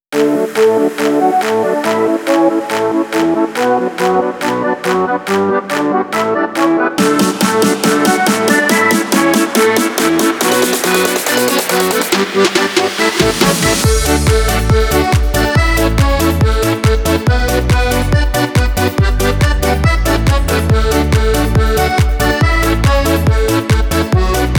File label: house electronic techno; By Burak Sound Bank